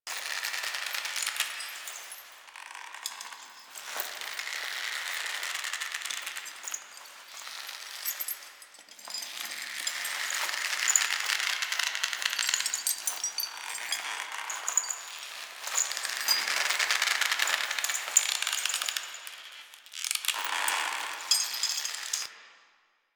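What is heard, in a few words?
Hammock Swing Loop; Ambience; Props; Warframe; The New War; Zariman; Quests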